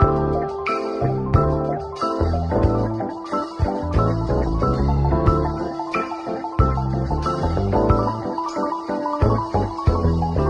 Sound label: keys, phonky loops